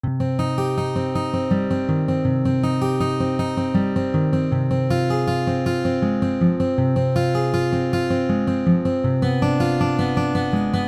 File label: Prelude in C Minor; BWV999